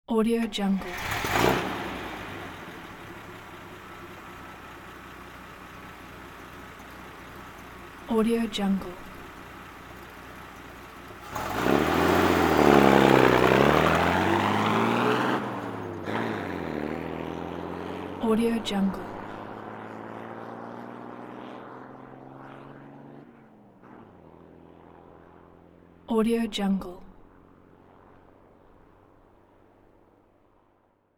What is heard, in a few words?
truck